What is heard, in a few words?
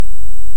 Hydrophone; OneOcean